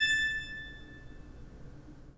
PIPE, 3DOrgans

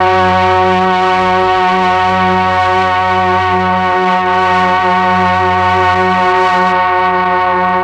MEL STR, Legendary M400; ILIO; Propeller Island

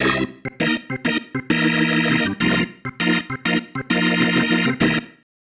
Pattern, Organ

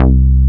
JNO TEK C3, TEK BASS; Drums In Your FACE!

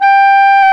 WND CLARI, Clarinets, Clar Bryt

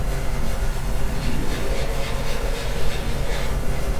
Noise Loop, sparse soundscape

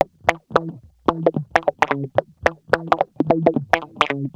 Guitar Loop; Ambient Grooves

